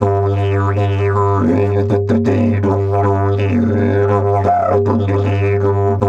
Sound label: Didge 80 BPM, Didgeridoo